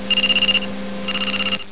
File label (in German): WECKER